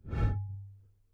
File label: Bottle Blown